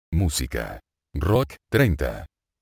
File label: rock